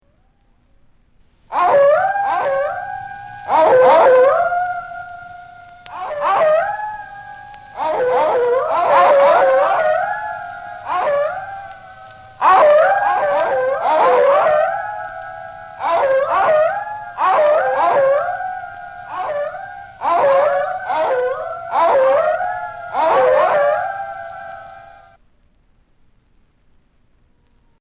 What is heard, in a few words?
Coyote, Haunted House; Halloween